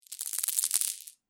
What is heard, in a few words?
Marbles Or Pebbles Rattle; Several marbles or small pebbles being dropped.; Miscellaneous Toy Sound Effects